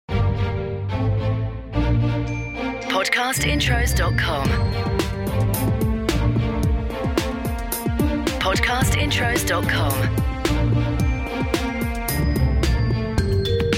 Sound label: CORPORATE; Podcast Watermark